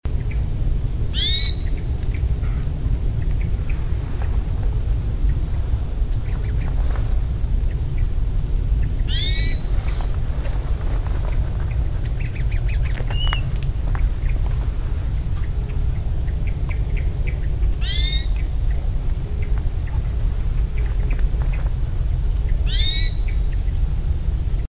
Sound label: Hermit Thrush; Calvert MD